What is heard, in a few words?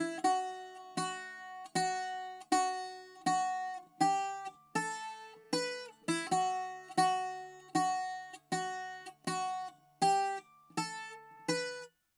bouzouki